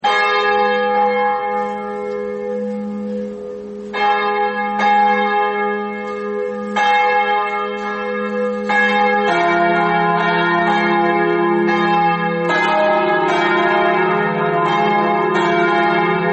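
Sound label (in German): Glockengeläut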